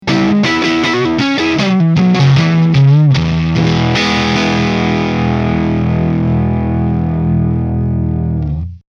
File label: fender 57 deluxe